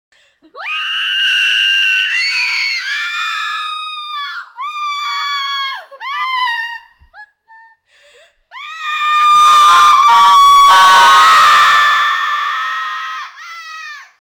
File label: excited screams and squeals